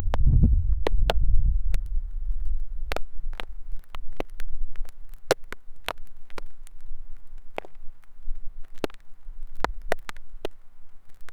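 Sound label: rocks falling from the top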